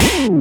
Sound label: BREAK BLAST, percussive